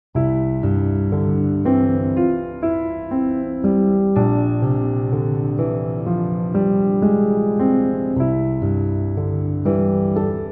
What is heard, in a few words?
Podcast Variation Piano